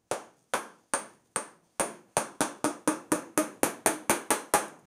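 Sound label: balls bouncing sound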